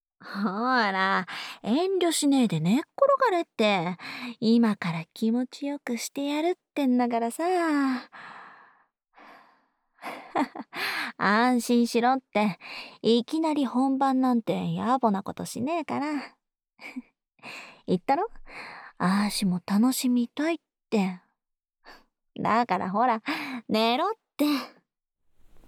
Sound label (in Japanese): 耳元で囁きながら耳舐め（左右）, ASMR